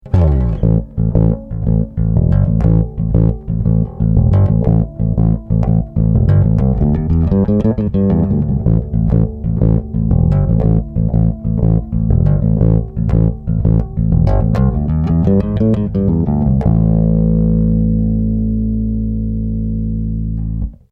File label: Mid Boost Finger